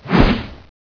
skull axe stab

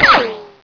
hook

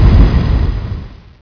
flamethrower shoot

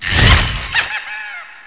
pump throw, weapons

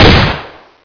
mac10, weapons

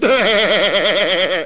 haha

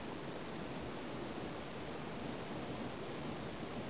ambience; de torn

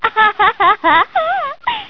haha

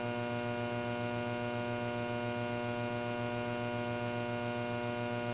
electro

